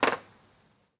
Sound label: tap